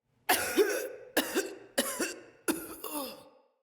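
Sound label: Cough Walk Female; Battle Barks; Envoy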